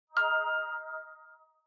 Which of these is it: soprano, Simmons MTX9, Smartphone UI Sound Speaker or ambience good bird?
Smartphone UI Sound Speaker